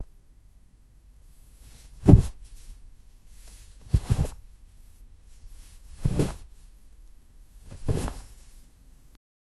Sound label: pillow poof